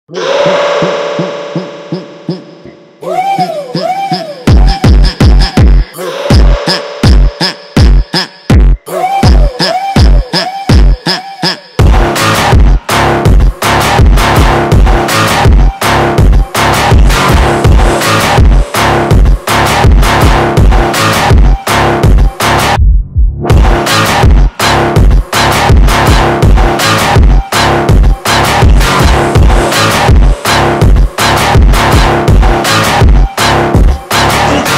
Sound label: brazilian phonk